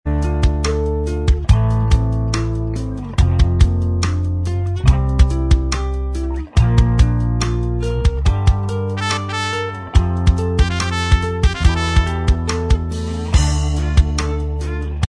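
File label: instrumental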